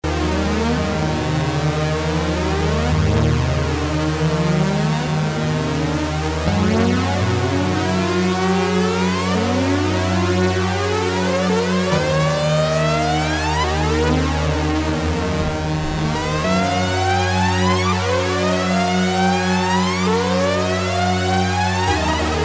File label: fx siren; Creamware minimax